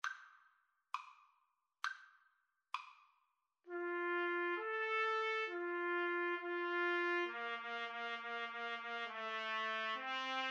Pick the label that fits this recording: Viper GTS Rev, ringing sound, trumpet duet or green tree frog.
trumpet duet